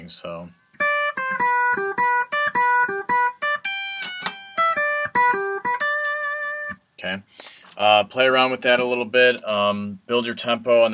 oldtime fiddle fill lick

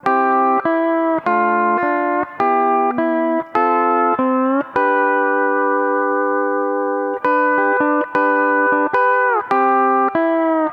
C I ii iii IV V, steel guitar

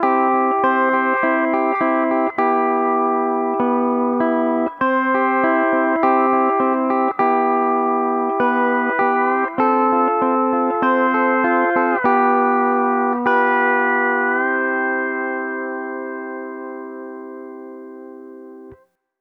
C I iii I iii IV V7 I, steel guitar, improv

